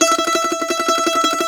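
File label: MANDOLIN TREMOLO, Plucked Strings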